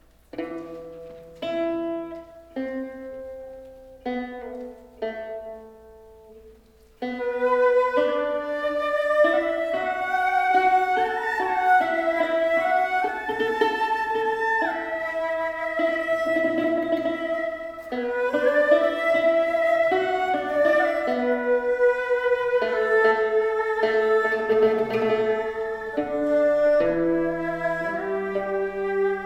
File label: trio folkmusic prelude